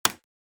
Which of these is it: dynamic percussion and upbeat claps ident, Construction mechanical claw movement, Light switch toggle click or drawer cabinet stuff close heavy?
Light switch toggle click